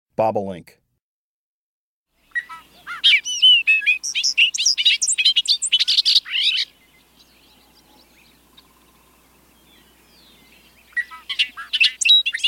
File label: Bobolink, Birds of the Chicago Region